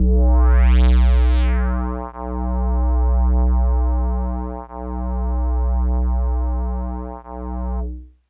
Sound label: Hologram Kawaii B0; keys